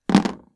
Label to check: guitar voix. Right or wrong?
Wrong